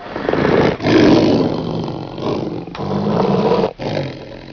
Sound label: walrus